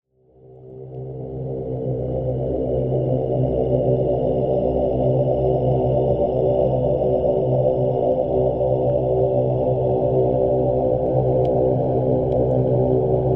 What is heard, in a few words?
om mantra